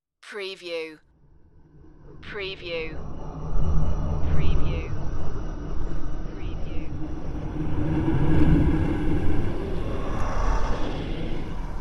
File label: dark force passing